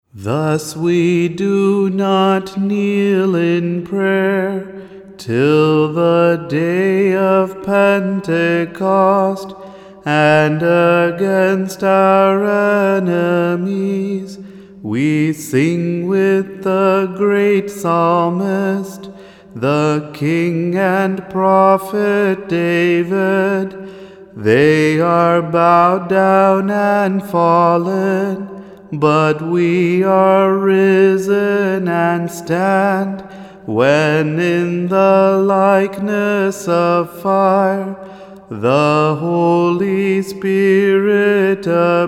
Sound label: Pentecost antiphon